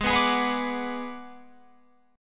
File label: Ab Minor 7th Flat 5th Ab, down, Guitar, Open F; Abm7b5; strummed